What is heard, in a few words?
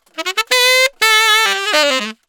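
100 Acid Jazz sax A major; Brass; Digidesign; Pro Tools; Pro Tools Essential Loops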